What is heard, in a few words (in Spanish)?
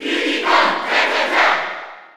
Cheer Spanish; Diddy Kong; SSB4